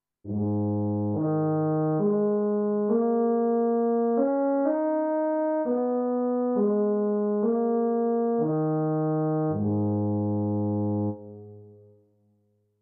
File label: Fr Horn Solo